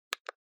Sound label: Cell Phone Hardware Button